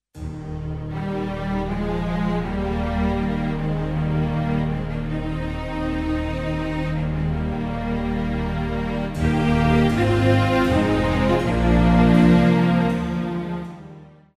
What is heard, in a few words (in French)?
MUSETTE; Musica Sacra; Antologia di Musica Sacra Vol.2